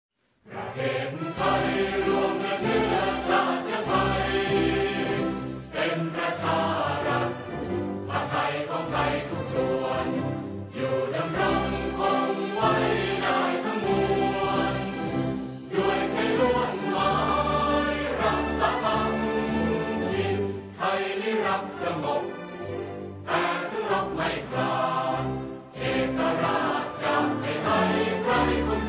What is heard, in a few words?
Thai National Anthem Phleng Chat Thai; Thailand